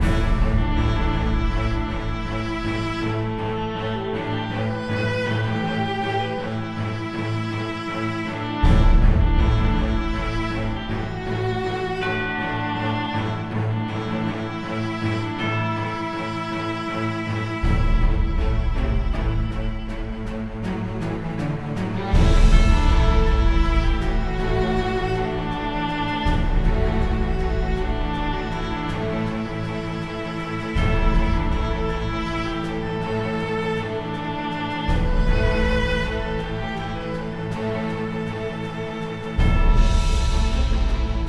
Sailing

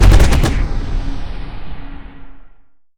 Sound Effect Dissent Eliminated

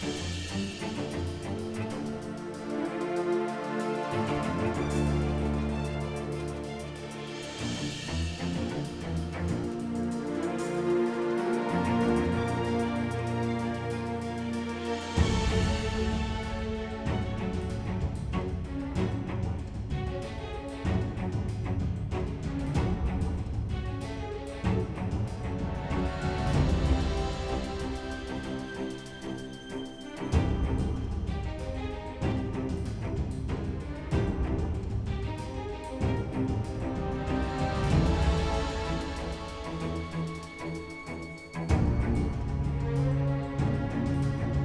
Action; The Amazing Spider-Man

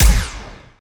Pistol Fire Longer Tail

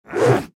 Boss Jump; red ball 4 vol 1 flash online gamerip 2014

